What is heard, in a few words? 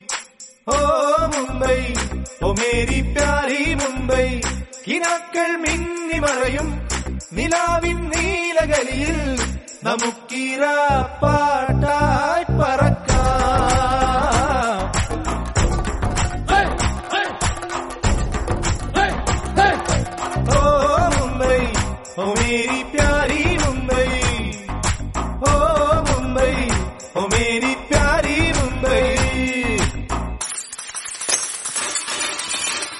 malayalam song